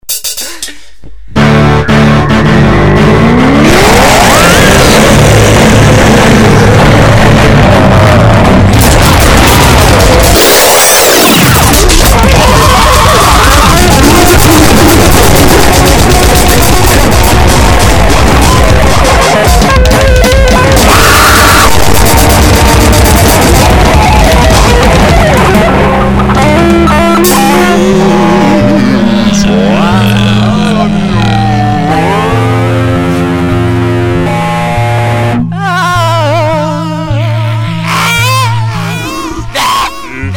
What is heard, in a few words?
zouk